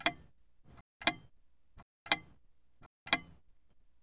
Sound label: Clock Antique Tick; Home & Office